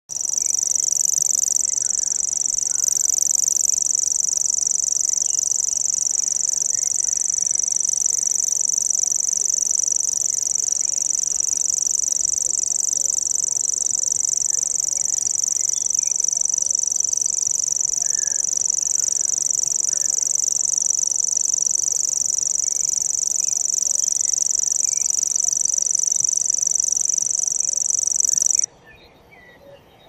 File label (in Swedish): Gräshoppssångare